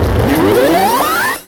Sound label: Sweeps Short